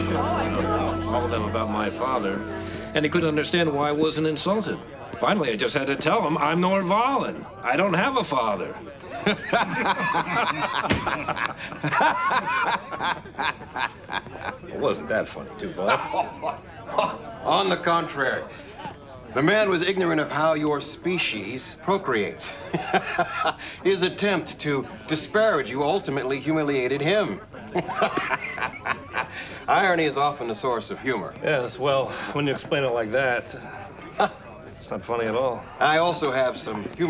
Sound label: laughing; tuvoc